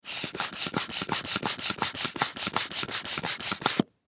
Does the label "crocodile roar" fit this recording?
No